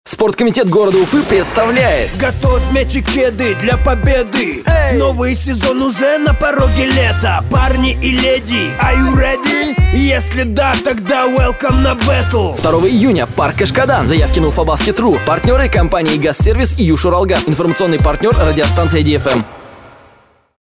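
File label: vocal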